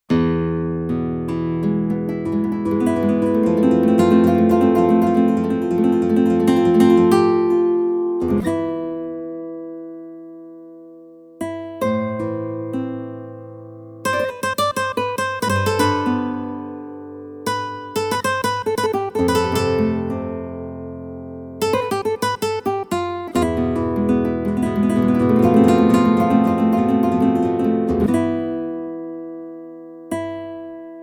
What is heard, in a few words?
yamaha csp-170 flamenco guitar